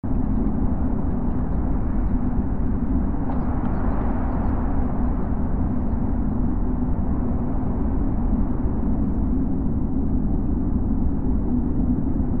Amb Basement

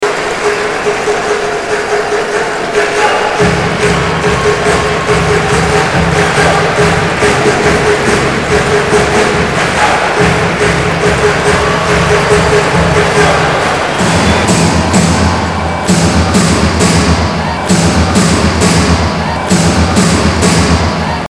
BUM BUM BUM LET S GO DRUMS

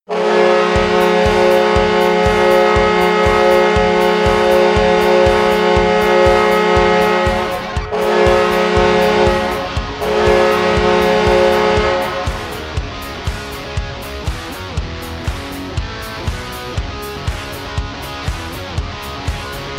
Horn

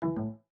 Windows Hardware Remove

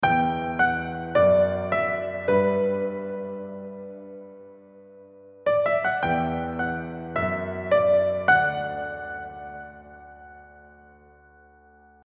Chorused Piano

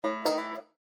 Hardware Insert; Windows

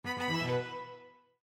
Windows Battery Low

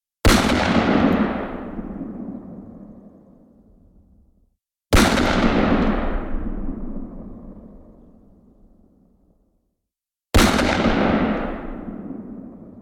Magnum Shots

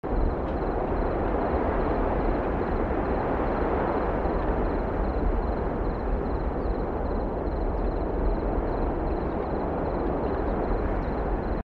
Amb Train Track Int

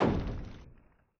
device error

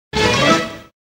CARTOON TRANSITION

